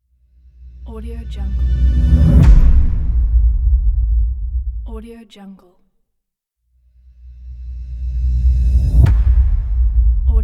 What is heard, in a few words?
impact big